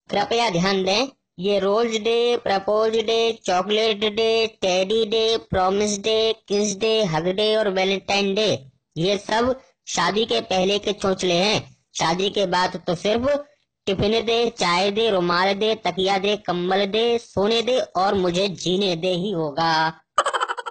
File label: Funny Ringtone; Valentine Day After Maariage